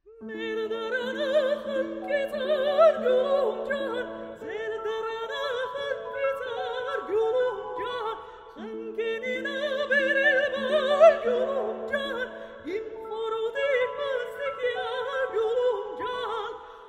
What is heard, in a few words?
Armenian folk; Խնկի ծառ